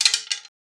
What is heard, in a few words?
wood falling; wood metal SFX